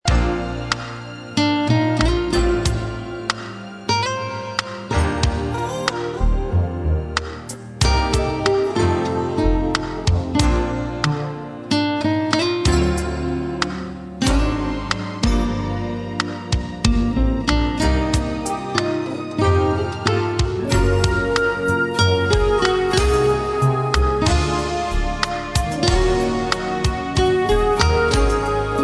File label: easy listening